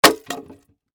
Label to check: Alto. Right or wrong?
Wrong